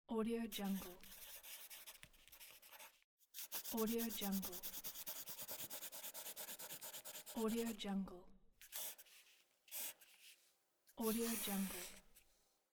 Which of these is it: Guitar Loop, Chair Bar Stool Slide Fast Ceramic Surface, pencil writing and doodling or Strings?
pencil writing and doodling